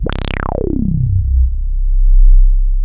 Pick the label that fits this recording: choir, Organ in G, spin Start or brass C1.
brass C1